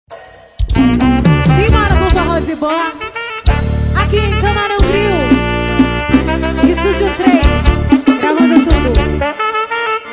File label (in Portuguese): forro sound